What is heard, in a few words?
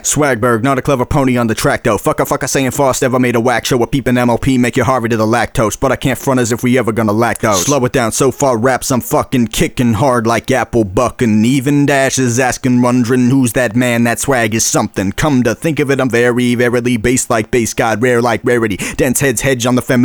verse, Vocal Stems, 120BPM; Pony Fan Music Remix Stems